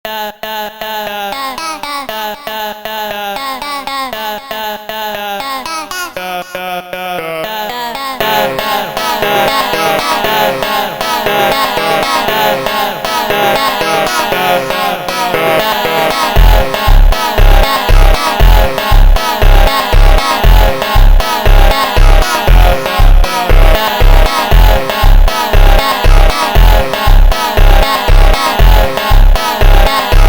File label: Funk (Slowed Instrumental)